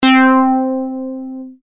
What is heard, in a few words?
synthlead